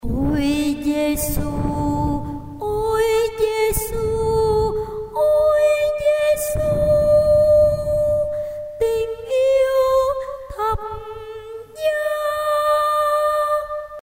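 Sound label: soprano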